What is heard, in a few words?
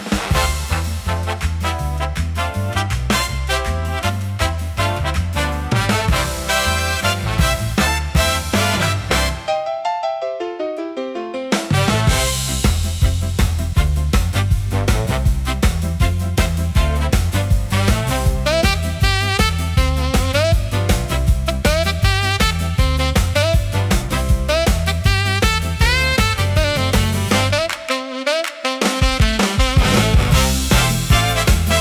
Showtime Stomp, big band swing